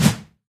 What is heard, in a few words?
projectile swoosh; source engine; tf; passtime; video games